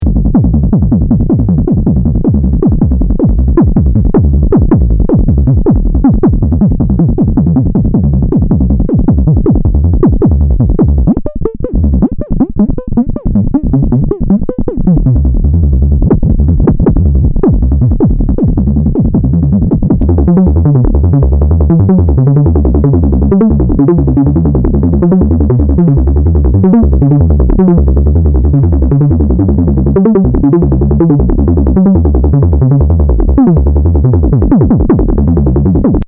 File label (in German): microbrute ein FunSound ein Wheel